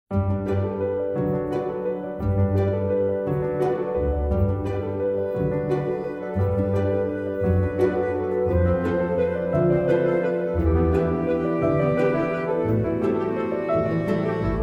valse aeree

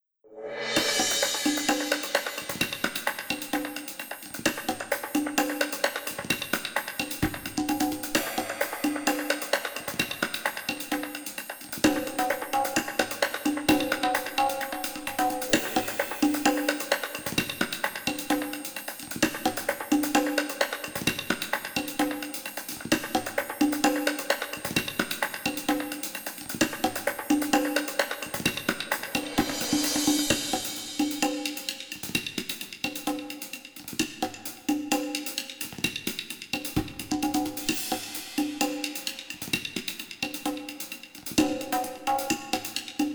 version percus; le meilleur des patissiers

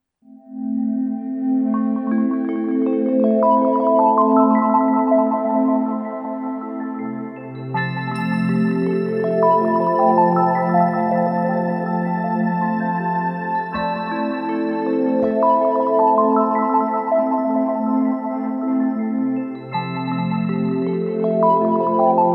eveil de la nature

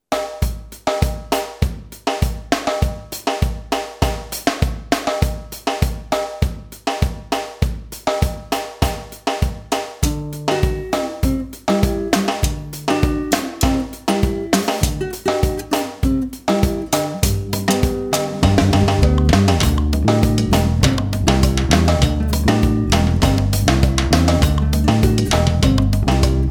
marche creole

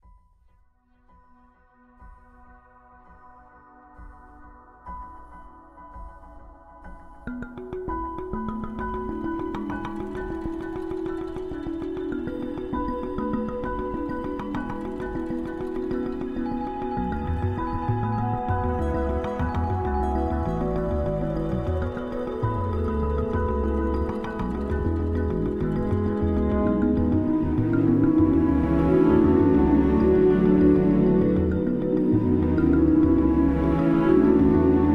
eveil africain; handpan

